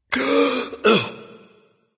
gasp; smite; voicepack; ullr

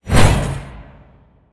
swing; smite; chaac

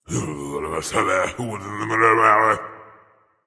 grumbling; smite; voicepack; raijin

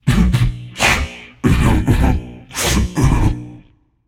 boohoo; smite; voicepack; jorm

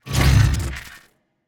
spirit flail activate; smite; osiris

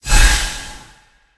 swing; smite; god serqet

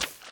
recall footstep; smite; poseidon

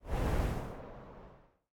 slide; Smite; god Medusa v2

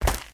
footsteps; smite; god merlin

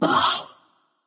"ugh"; smite; janus

